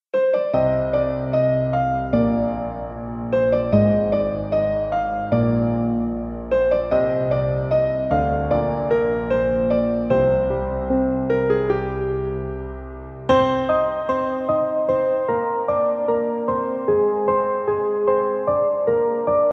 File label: Instrumental Tone; Jackpot